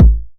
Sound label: 909 BD; Goldbaby Tape808 & Tape909; The Tape 909